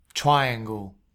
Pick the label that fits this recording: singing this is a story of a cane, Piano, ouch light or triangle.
triangle